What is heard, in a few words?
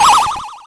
sound neutral; lows adventures